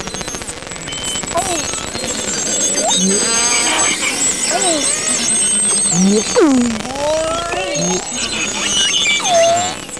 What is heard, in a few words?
beluga